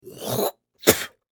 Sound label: Human Male Spit; Spitting